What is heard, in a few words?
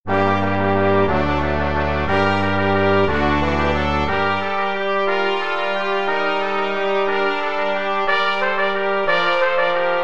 Carol Of The Bells Brass